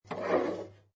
Pinball Sound Ball Roll; Video Games

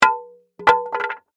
Wood Plank Drop, 2X4; Sound Effects:Materials; BlastWave

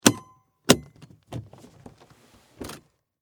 Foley, Suitcase, Open, Latches, Full, Hard, Shell; BlastWave

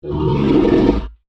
Dinosaur Snarl, Creature; Animal; Animals; BlastWave

